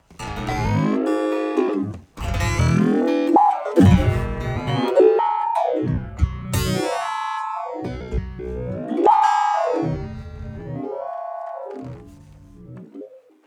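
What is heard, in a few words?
HARMONICS SEQUENCER; Manipulator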